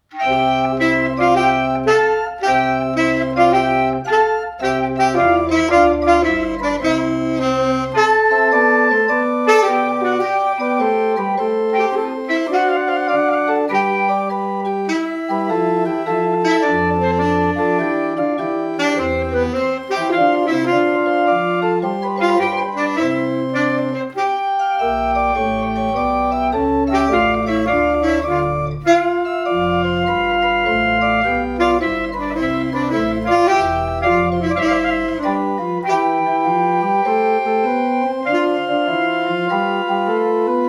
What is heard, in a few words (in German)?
Instrumentaltrio